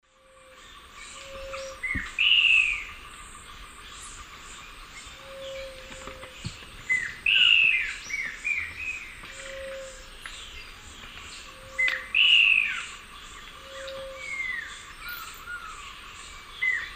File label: Cercomacra nigrescens, duet; S. America; Eastern Lowlands